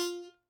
Hohner Special20 F Vib rel F3, Harmonica, Releases; Aerophones; Free Aerophones